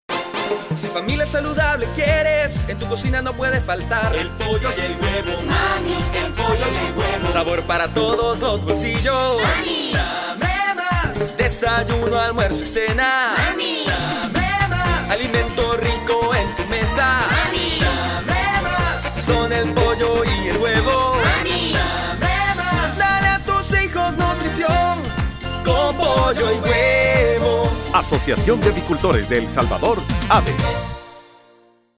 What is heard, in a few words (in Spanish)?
Jingle; Pollo y Huevo